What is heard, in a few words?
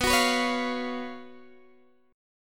Gb Major 7th Suspended 4th B, guitar